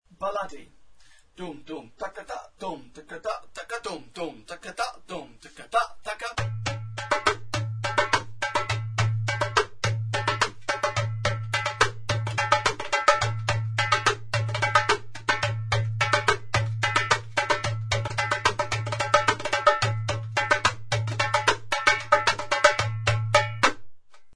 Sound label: balladi